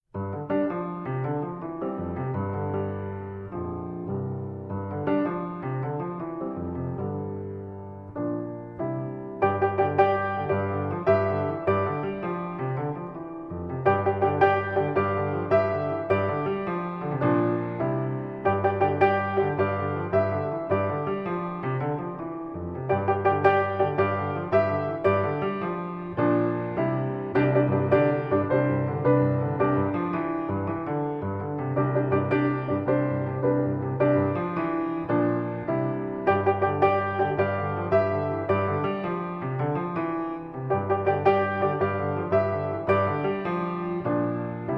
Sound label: piano movement